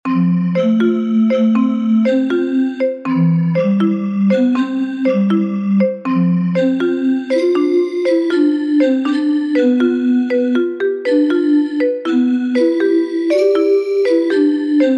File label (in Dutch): Onderwatermuziek